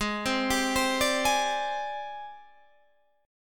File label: Ab Major 7th Flat 5th Ab, down, Guitar, Standard; arpeggiated; JGuitar